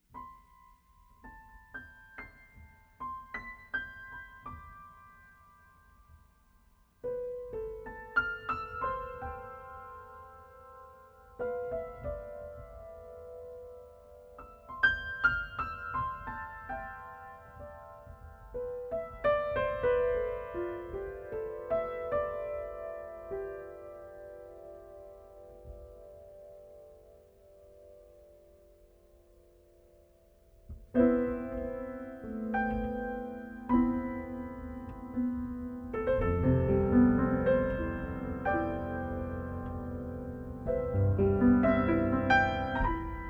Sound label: Pianist; Los Angeles Pianist; jazz